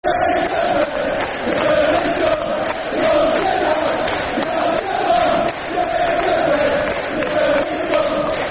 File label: Interbred Burnley Scum [Football Chant (Soccer Song)]; Blackpool Bfc